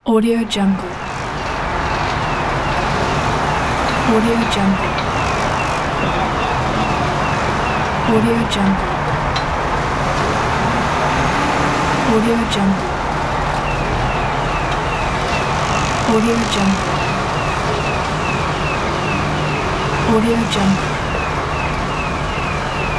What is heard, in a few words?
truck drives back